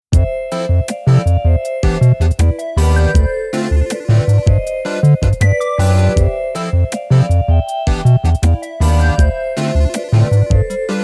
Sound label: Chiptune; The Coffin of Andy and Leyley